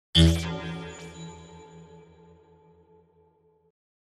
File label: Cyber